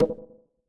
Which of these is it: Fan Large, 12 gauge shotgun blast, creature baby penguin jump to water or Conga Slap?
Conga Slap